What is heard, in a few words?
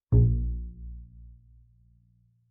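Bass pizzicato fortissimo sul E Bb1, Double Bass; MIS Pitches - 2014; Strings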